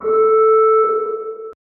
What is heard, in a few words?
Alarm Far Slow; Alarmssirens; EK modern